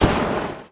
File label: PYRO STRT; forsaken